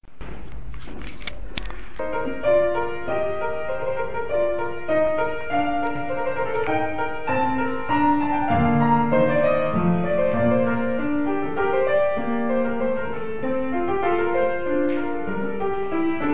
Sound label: piano